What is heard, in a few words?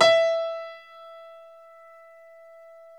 E4 PIA, GRAND; BOS.GRAND